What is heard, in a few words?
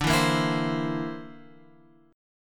D 7th Suspended 2nd Sharp 5th; D7sus2#5 Chord {10 7 8 9 X 8} strummed